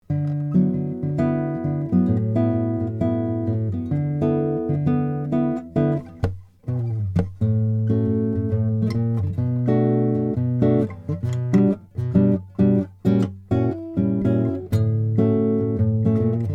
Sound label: lowden s35; 40th anniversary